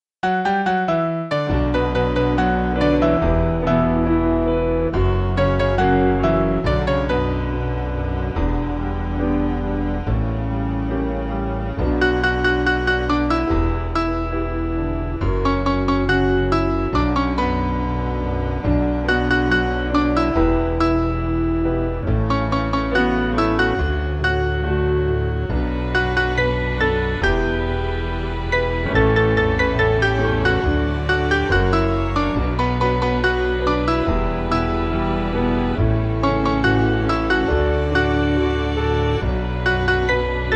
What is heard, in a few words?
Piano; Great And Marvelous; Mainly Piano (CM)